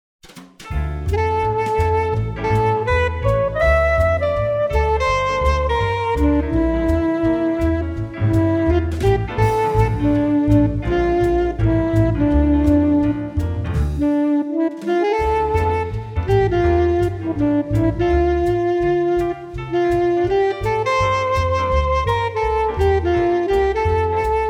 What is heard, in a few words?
Dm